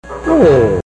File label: hooo